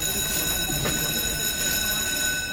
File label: school bell